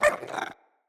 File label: Kubrow Puppy Interact Bark Speak; Vocals